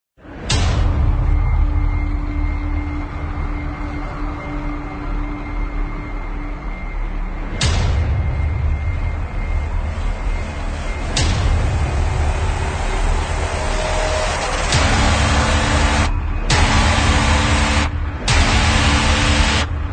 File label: Epic Trailer Intro Ident stripped